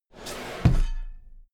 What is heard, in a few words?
drawer cabinet equipment close heavy halfway